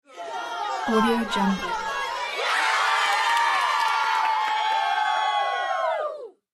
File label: sound of cheering children